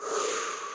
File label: air; ageofwonder